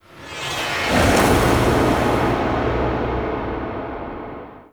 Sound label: Hub Texture, Ambience, Dungeons, Under City, Crypt; Champion Crypt Hub